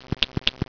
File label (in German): SCHERE